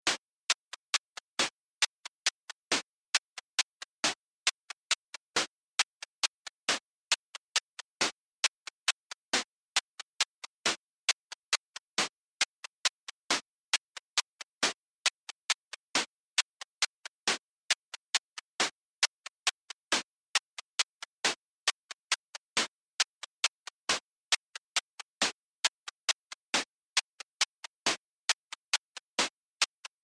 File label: only bass